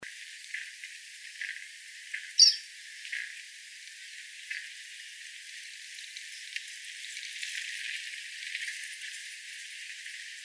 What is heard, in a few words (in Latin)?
Laterallus melanophaius